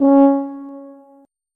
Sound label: tuba note